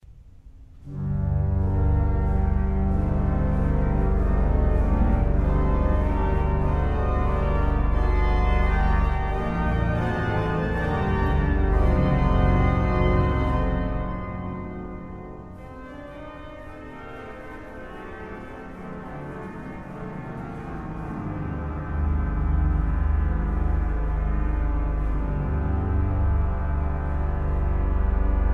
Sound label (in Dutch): orgelklanken; Bekende orgelklanken